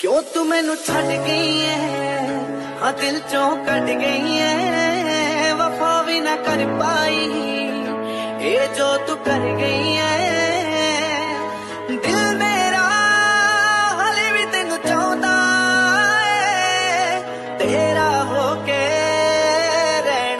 ringtone, bollywood ringtones

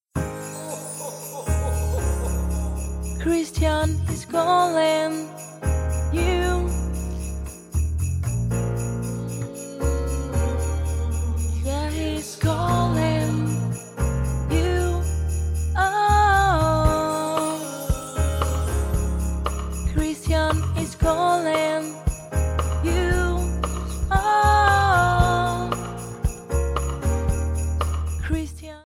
christmas ringtone

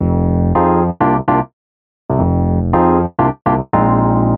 funky piano jazz music message tone ringtone

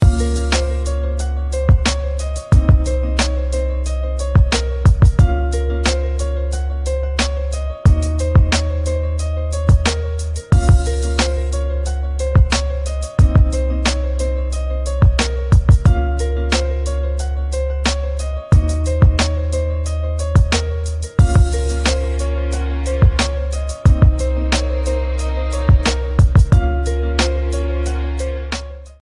instrumental beats ringtone

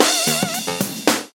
qmobile sms ringtone

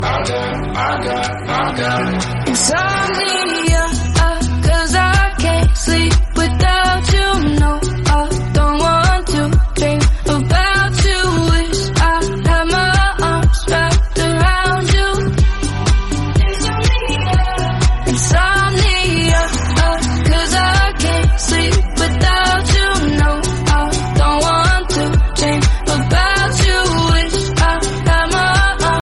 english dance song tone ringtone

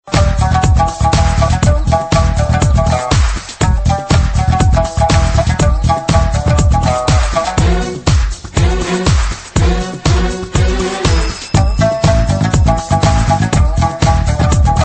spanish strings ringtone

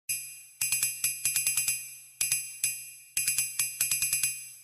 ticker alert alarm musical tone ringtone

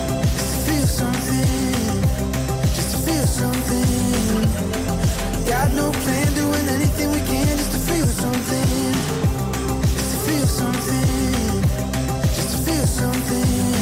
english music ringtone

